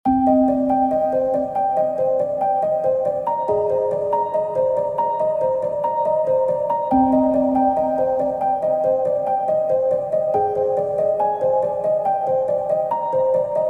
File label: 140bpm Tonal Oneshot; Going Home; NEO Pianik 2